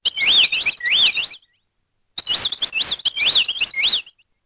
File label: Bird chirp; Siemens M65